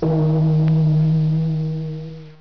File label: ding